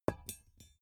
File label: small metal drop wood soft; industrie wirtschaft; metall